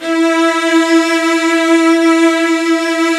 strings E3

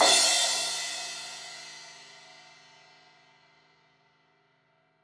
Crash 16in edge, Cymbals; Deeply Sampled Drums

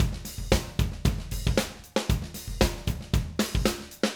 Acoustic Live Drum

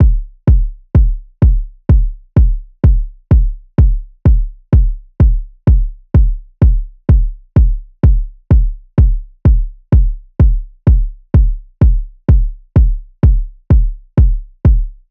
loop kick 127; Seismic Loops

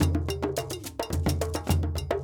Djoun, World Percussion; Djembe, Donno, Bell, Shaker

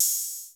ELEC.DRUMS; Crypton - DrumBase5000

